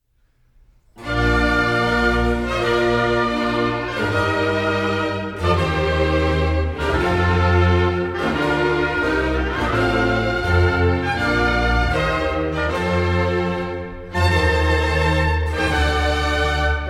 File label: Concerto a due chori in B flat major, Ouverture; HWV 332; Handel Masterworks; Klassika